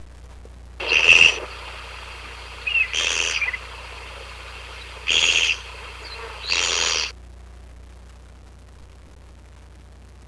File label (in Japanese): kogera